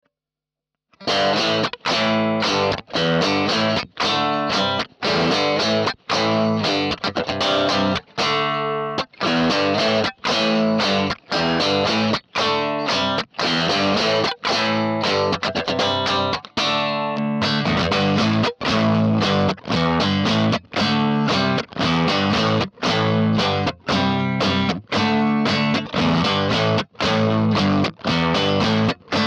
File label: AC-10 Strat